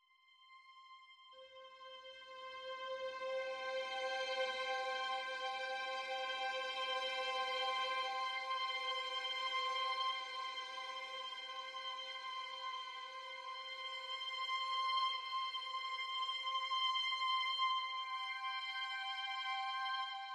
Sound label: Strings